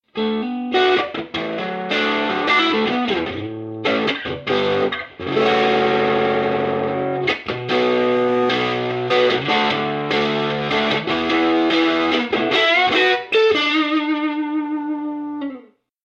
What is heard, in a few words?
overdriven